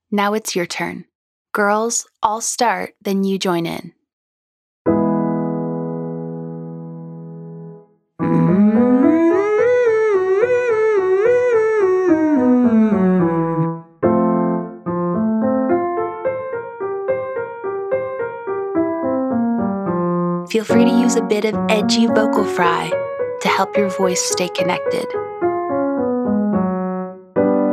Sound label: Humming Long Scale Female